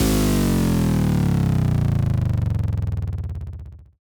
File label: Bass Drop; SoundsCrate